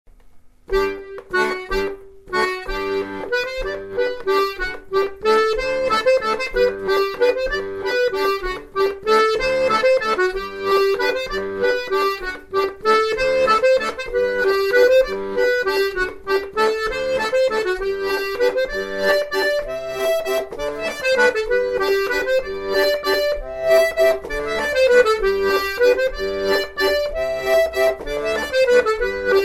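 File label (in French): bourree, accordéon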